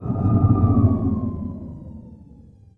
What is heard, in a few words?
power decrease; rebel